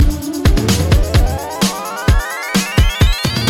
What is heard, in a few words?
Magic Sms